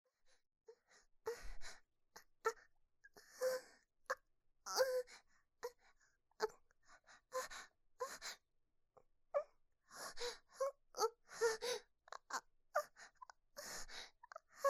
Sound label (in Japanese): 喘ぎ 中 弱